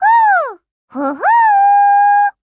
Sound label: wind